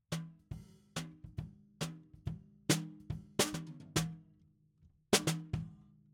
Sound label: SNR BTM